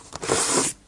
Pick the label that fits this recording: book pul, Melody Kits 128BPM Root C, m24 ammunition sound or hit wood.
book pul